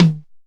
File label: Yamaha RX15 Tom